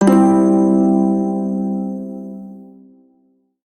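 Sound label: Shawzin Pentatonic Major Chord; Warframe; Prime Shawzin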